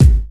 boomy kickdrum sound g sharp key, bass drum single hit tuned to the G# note; kick drum samples